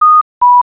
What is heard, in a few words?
cuckoo